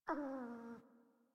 Kitten Snore; Enemies; Warframe; Catbrow

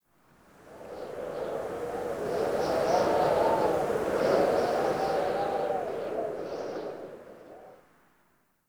Cave Ocean Wind Gust; Ambience; Midrath Island

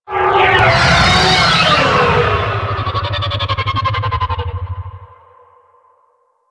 Fly By Quick, Vehicles; WM Liset; Flight

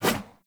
Sword Whoosh; Weapons; Player; Swords; Garren Sword

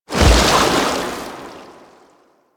Tidal Wave End; Warframe; Warframes; Pirate

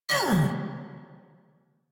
Pain; Warframe; Eidolon Grineer

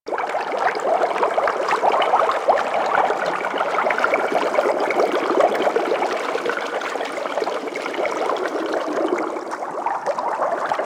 Idle Bubble Loop; Warframe; Sharkwing; Vehicles

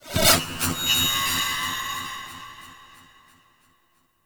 Drop Spawn; Enemies; Sentient; Sentient Movement

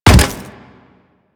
Step Default; Leto

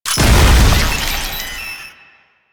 Proto Infested Maggot Explosion; Warframe; Abilities

